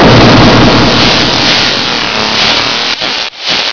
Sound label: shoot